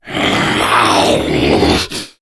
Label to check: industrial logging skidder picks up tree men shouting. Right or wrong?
Wrong